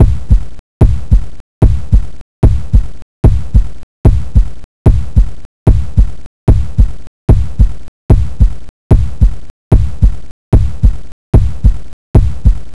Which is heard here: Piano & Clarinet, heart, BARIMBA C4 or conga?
heart